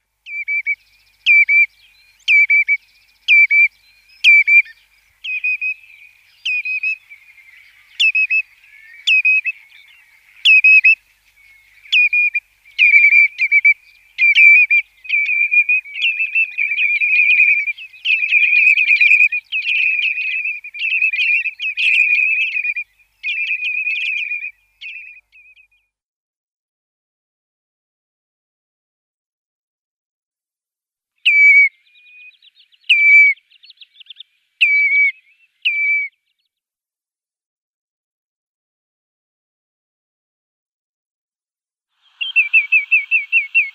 Common Redshank calls

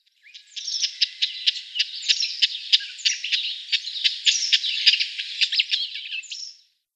Cyprus Wheatear calls

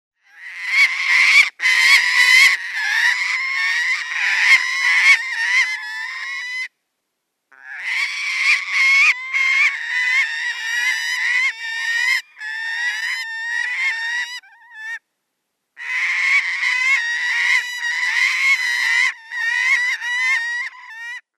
Saker Falcon nestlings

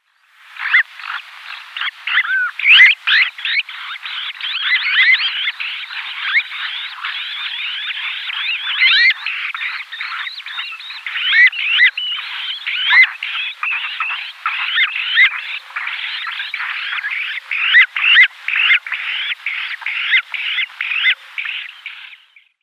Azure winged Magpie nestlings

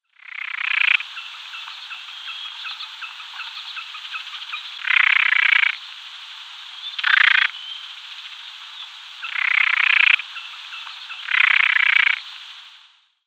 Jack Snipe calls

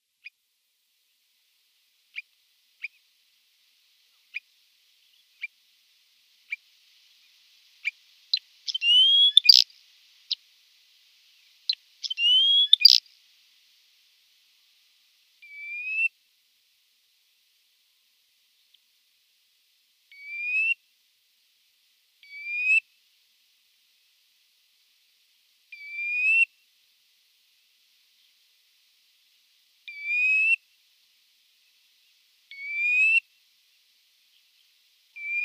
Thick-billed Lark male calls

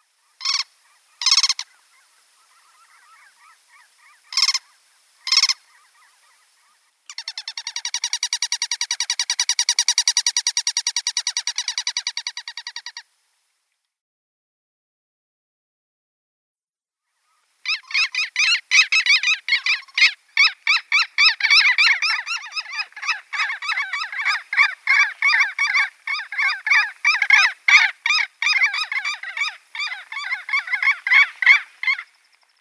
Black-headed Lapwing pair calls

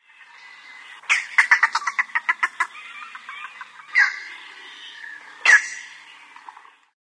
Green Heron calls

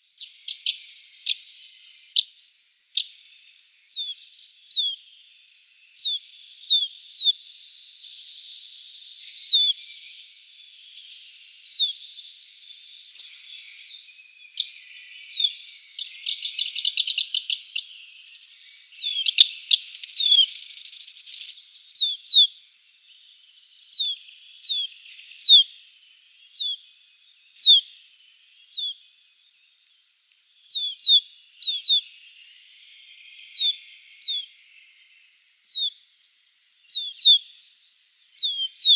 Eurasian Crag-Martin calls

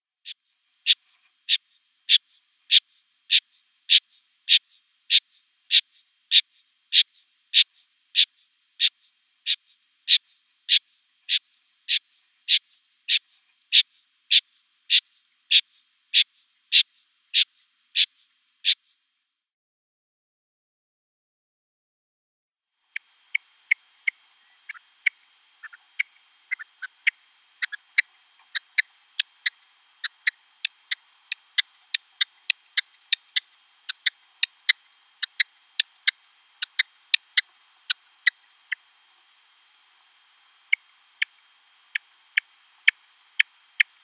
Hybrid Rock x Red legged Partridge male display

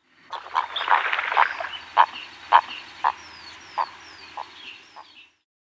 Bittern odd flight call

